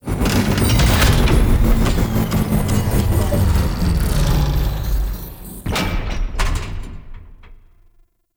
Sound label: Machine Thrum; Warframe; Quests; Entrati Labs; Cavia Rank Up Scenes